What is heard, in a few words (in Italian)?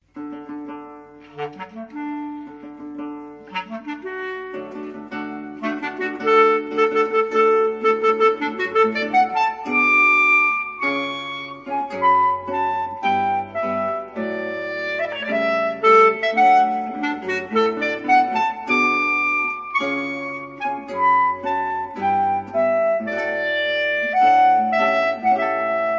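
chitarra e clarinetto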